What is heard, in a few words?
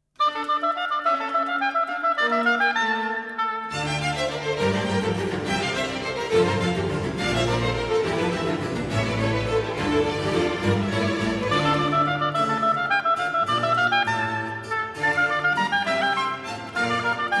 oboe; marcello